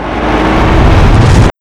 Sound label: generic charge windup; tf2